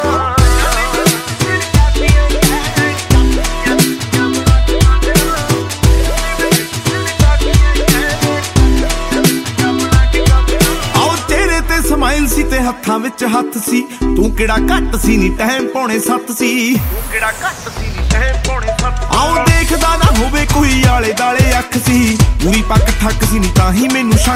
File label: punjabi, ringtones